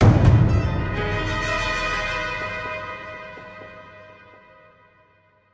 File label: Stinger; the walking dead survival instinct gamerip